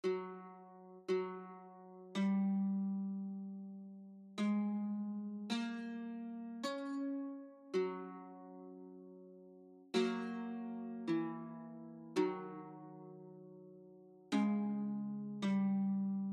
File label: Linear Diegetic Camp Incidental; Game of Thrones: A Telltale Games Series